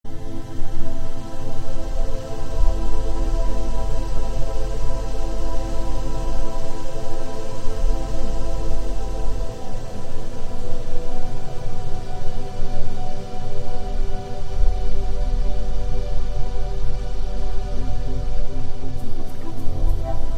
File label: Reflections Underwater